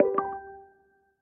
traffic report ding dee; Samsung Galaxy S10; Maps

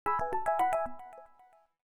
A toy watch; Samsung GT-S8530 Wave II

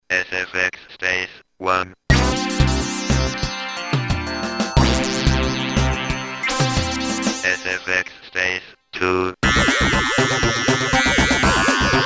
FX2 4op

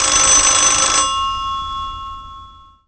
phone old; Siemens; SX1

